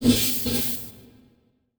Transition Blast Pulse; Production Element Title Transition

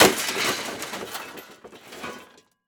Crash Metal Tumbling Down On Debris And Pavement; Crashes

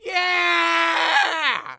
Human Male Yells And Cheers Yeah

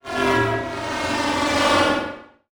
Transition Whoosh Long; Production Element

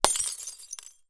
Crash Glass Bottle On Cinder Block Smash; crashes; AdobeCC

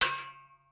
Weapon Bullet Shell 7MM Dropping On Metal; AdobeCC

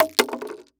Impact Object Metal Object Fall On Boardwalk

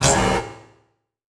Transition Blast Short Hit; Production Element Title Transition

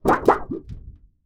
Cartoon Metal Sheet Cartoon Wobble

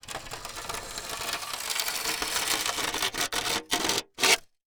Household Metal Pan Scrape On Cement Squeak

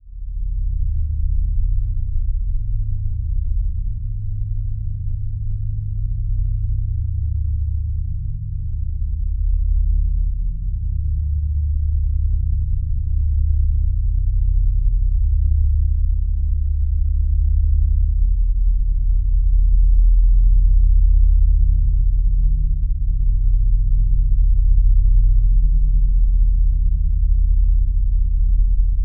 Drone Dark Bass Deep Tone; Production Element Imaging; AdobeCC

